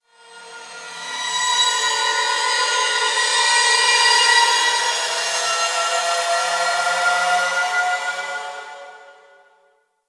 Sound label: Asteroid Distant Metal Textures; Ambience; Warframe; Grineer Asteroid